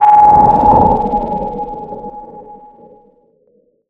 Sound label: texture sonar; Bluezone; Lunar; Cinematic Ambient Samples